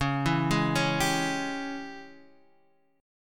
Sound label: G sharp Major 7th Suspended 4th Sharp 5th, C sharp